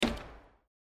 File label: stop